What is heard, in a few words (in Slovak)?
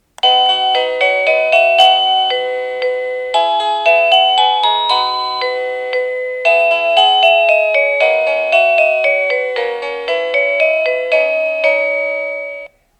Ukážka melódie zvonenia zvončeka